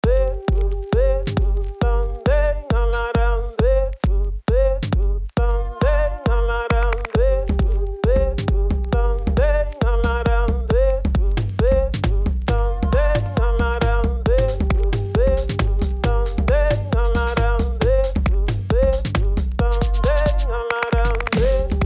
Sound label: Dirty Latin; Hero Zero